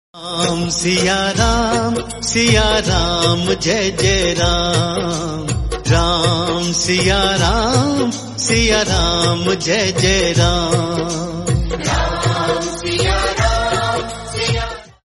bhakti hindi

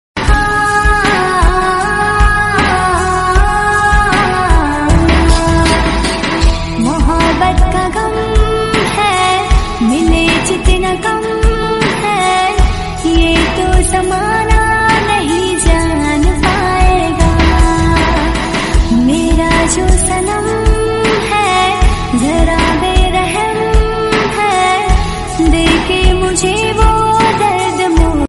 gana ki dhun instrumental; andaaz

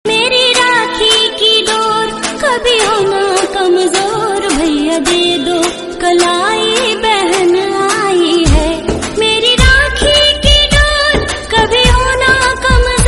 raksha bandhan song

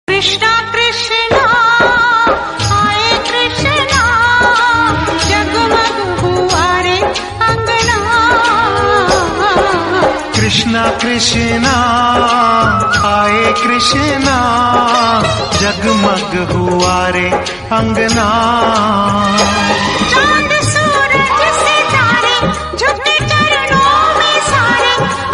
hindi remix

